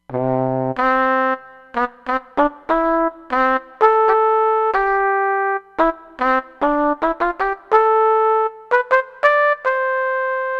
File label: Flugel Horn, Roland Fantom XR